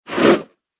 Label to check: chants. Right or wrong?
Wrong